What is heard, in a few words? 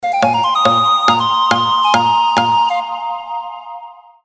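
flute melody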